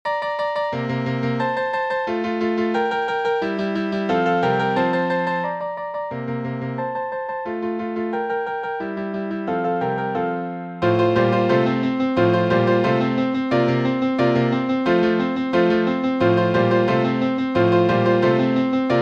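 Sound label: Staccato Etude, piano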